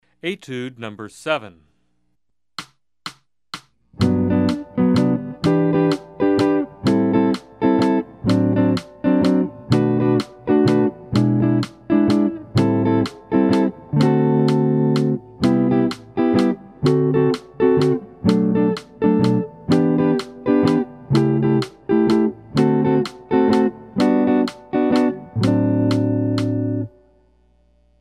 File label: Jazz